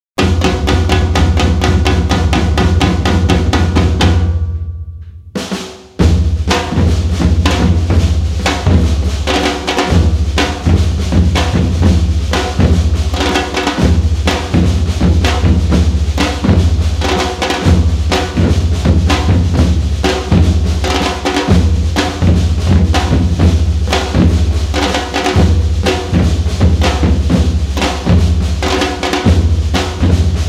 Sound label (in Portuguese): funk